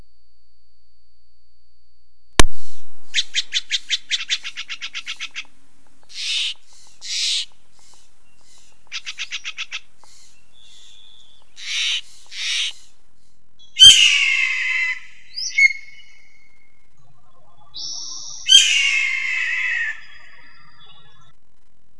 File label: Steller's jay